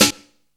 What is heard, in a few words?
tab, snares; Zero-G - Funky Elements